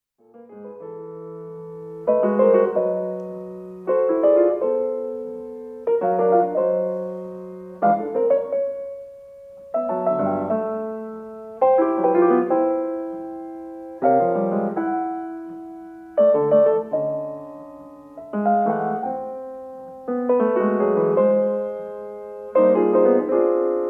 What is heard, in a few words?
piano piece